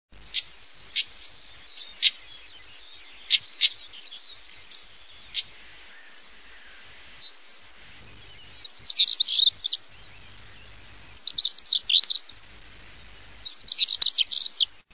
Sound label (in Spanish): canto tarabilla comun; aves campo